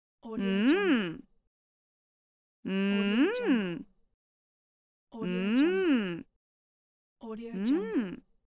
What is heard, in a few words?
mmm